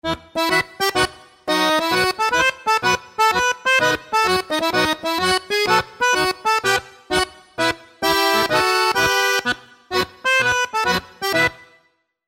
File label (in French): ACCORDEON